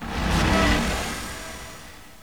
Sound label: portal ramp